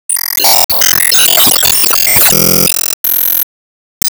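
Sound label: Stage Clear New Record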